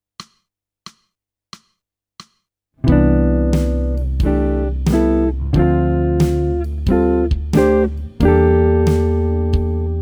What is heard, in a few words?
jazz